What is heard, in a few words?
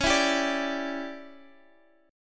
D Major 7th Suspended 2nd Suspended 4th Db